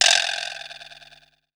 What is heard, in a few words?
vibraslap, Yamaha Motif ES drum machine; 300 Drum Machines; Yamaha Motif ES-Series